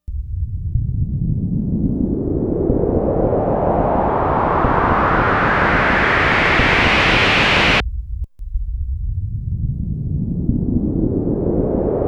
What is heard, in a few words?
JET, Moog Micromoog